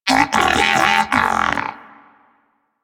Moa Pet disagree; Warframe; Pets; Tenacious

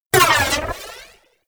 Power Use Blocked; Corpus Modular Crewman

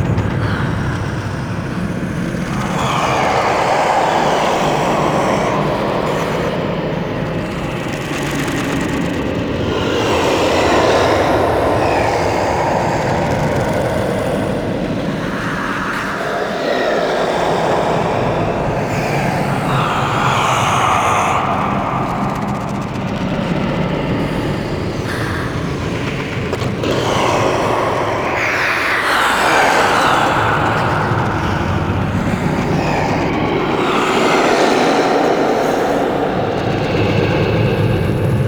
Tomb Interior Amb; Mummy Tomb

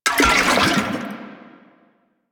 Grenade Aim; Alchemy; Warframe; Entrati

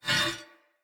Weapon Common Accent; Weapons; Player; Swords; Mockery

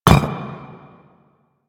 Footstep; Warframe; Warframes; Qorvex

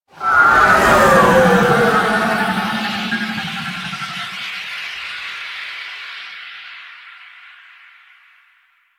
Extraction Arrival, Vehicles, Flight; WM Liset

